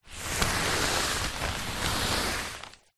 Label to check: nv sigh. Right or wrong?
Wrong